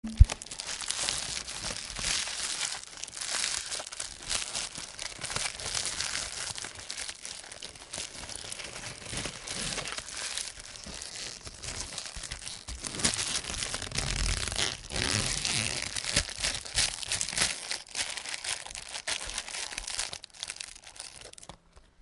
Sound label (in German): geschenkfolie